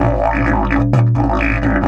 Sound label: 130, Didgeridoo